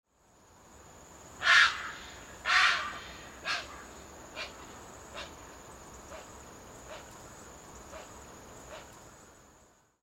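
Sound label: Grey Heron; aosagi; koe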